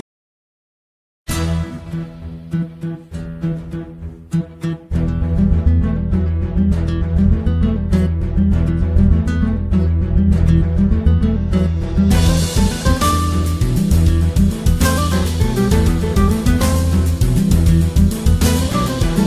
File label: Rington Turkey; Sad